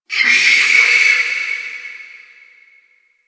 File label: Infested Distant Creature Cry, Ambience; Warframe; Corpus Infested